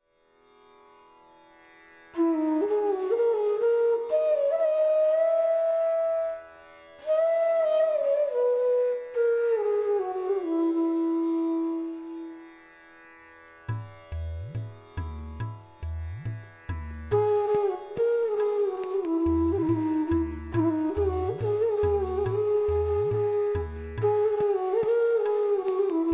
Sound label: Raga Yaman Flute; Bansuri Student Zone